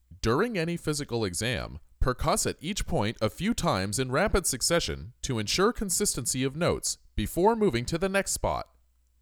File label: Percussion